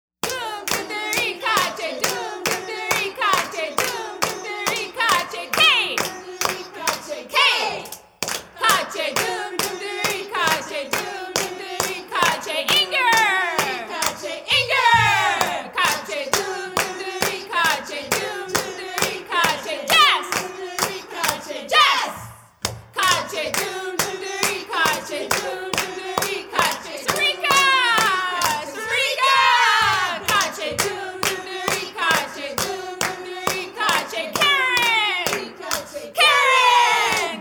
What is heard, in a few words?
Marimba; Mbira